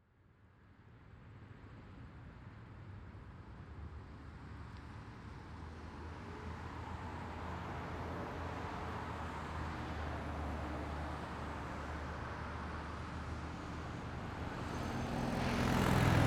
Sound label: Ambience Traffic; AdobeCC